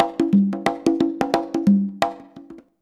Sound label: CONGA, Modern Percussion Loops; USB Soundscan vol.56 - Modern Percussion Loops; AKAI